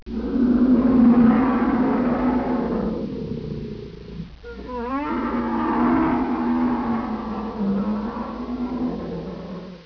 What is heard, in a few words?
bear